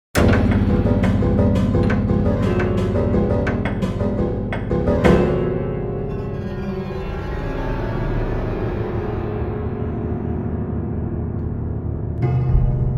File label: Prepared Piano Piece